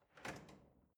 drawer large trash draw out

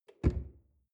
drawer large close lock

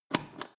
foot switch turn on off quick

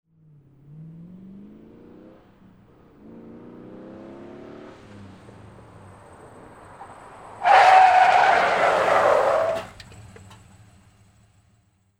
pontiac braking

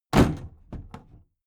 big plastic case lid put down heavy

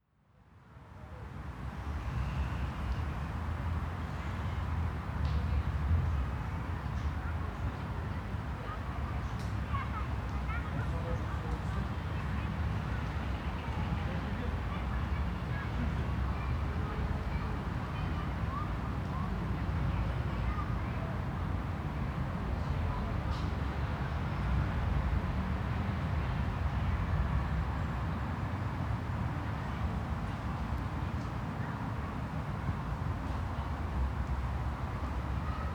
park children traffic

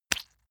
leather impact mud hard squelch

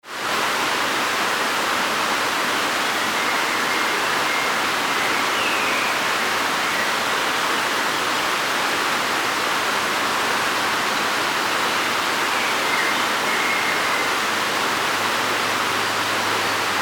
river rushing fast current birds

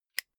zippo fire close

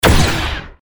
robot gun shot

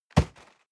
box hit hard bounce

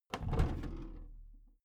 big plastic case lid lift soft

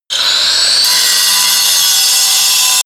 grinder bright on load

